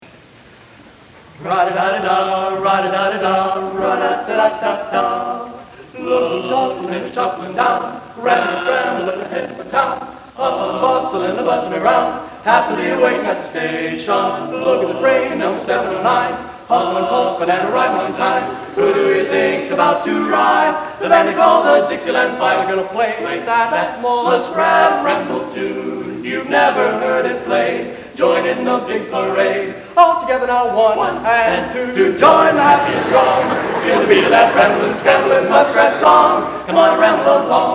Mens Quartet

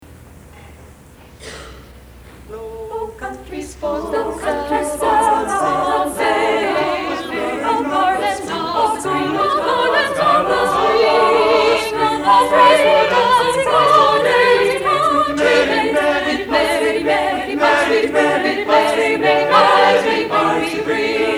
Renaissance Singers; Lo Country Sports

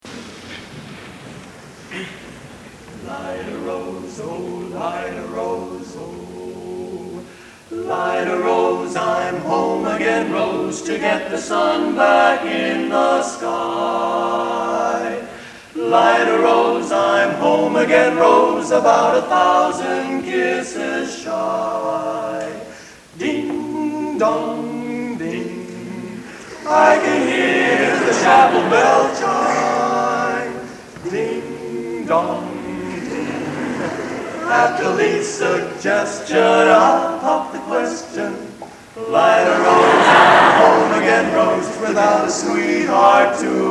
Mens Quartet; Miami U

